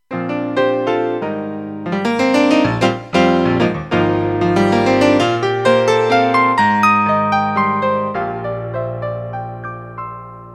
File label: Piano